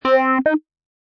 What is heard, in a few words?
Funk Synth End A 110, Synth loop in A# at 110bpm; Synth Loops